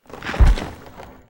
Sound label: TWEEZER WAR DAMAGE